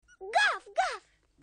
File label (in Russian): gav-gav